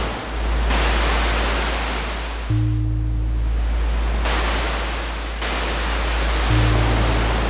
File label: meadows night